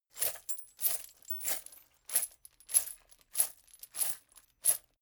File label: chain mail armor movement sequence